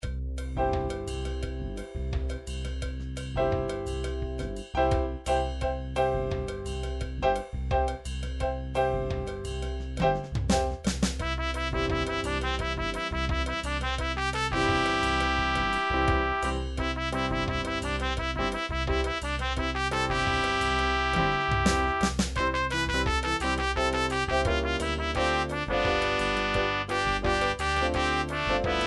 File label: bongo, midi